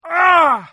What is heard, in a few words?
pain; spock